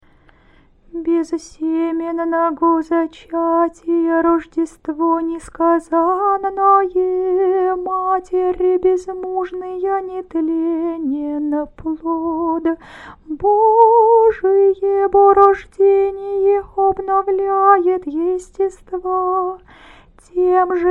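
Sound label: canon hirmos, singing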